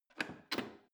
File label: plastic button creak defect press